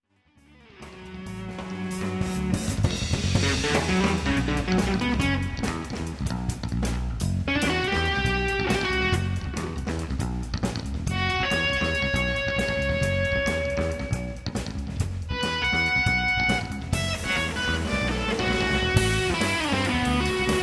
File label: Live Improv